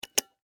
Pesticide Can Open, Extermination, Pesticide, Can, Open, Insect, Bug; Sound Effects:Animals:Insects; Blastwave